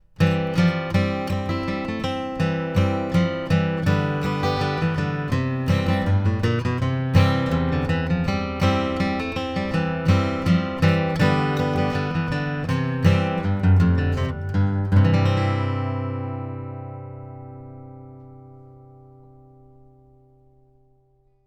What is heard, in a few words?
ACC GUITAR; BOMBLET